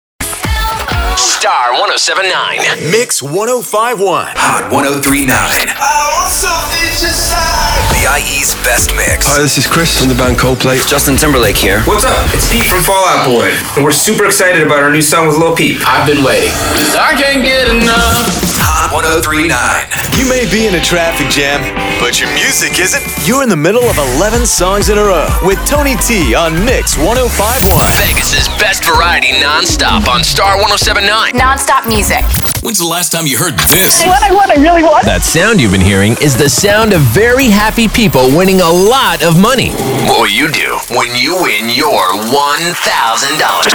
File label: Hot AC Custom Comp